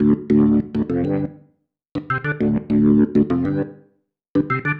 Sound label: Pattern, Organ